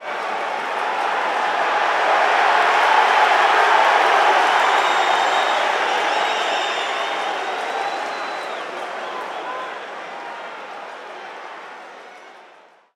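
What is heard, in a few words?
Basketball Crowd Cheer; SFX Kit; Crowds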